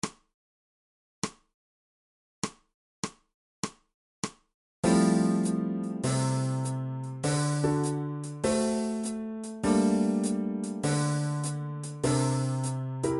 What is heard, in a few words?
2beat, fretless bass